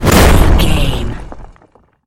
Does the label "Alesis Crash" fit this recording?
No